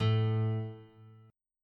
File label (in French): Accord; MIDI